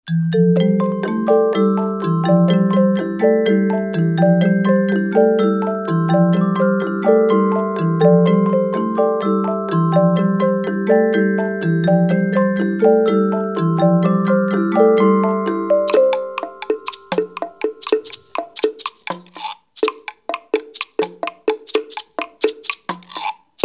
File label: Instrumental; Esta pequeña pieza instrumental con forma ABA es adecuada para escuchar y reconocer melodías tradicionales (aserrín aserrán, arrorró mi niño, la farolera tropezó, caracol co